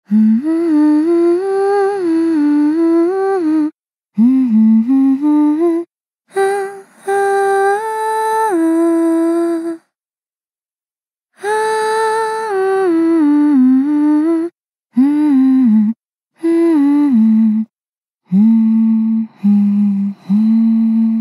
applause

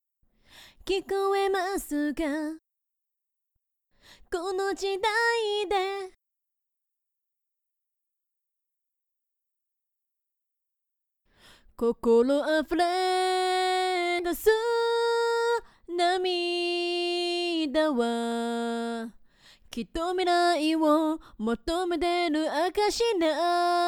Choir

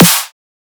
soft hit clap; osu

